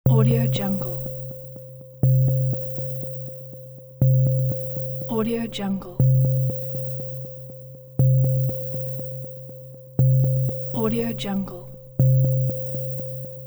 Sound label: countdown